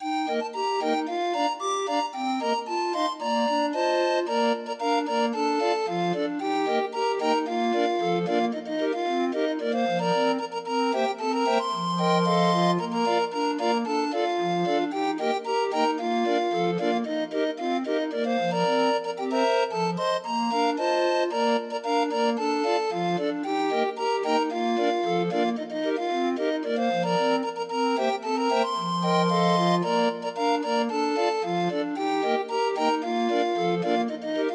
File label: Grind Organ